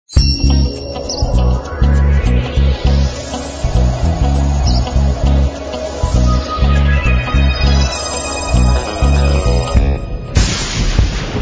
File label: funk